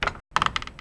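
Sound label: door gate open; Mafie 1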